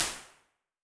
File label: block inside